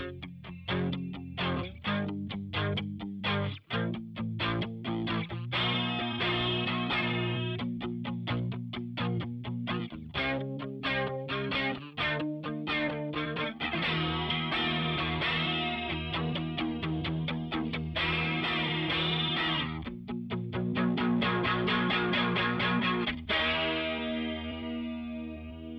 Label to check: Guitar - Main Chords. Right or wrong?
Right